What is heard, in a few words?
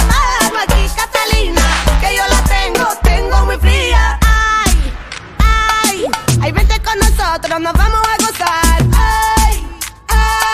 Instrumental Ringtone